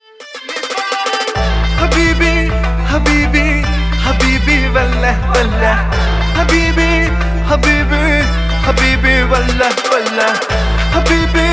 Best Punjabi Cool Song Ringtones

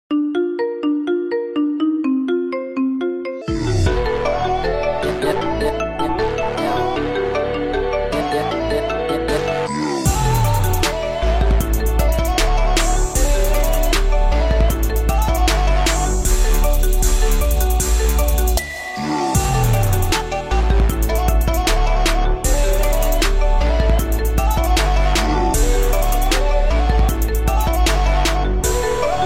Xiaomi Redmi Note 12T Pro Ringtone

